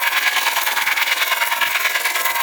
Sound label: Shell Pillar Spinning Ball Loop; Gameplay; Warframe; Corpus Railjack; Comet Shredder